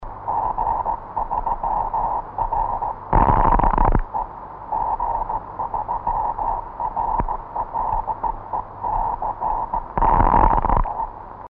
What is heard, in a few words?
aurora RF heater; G3RLE; at G3TCT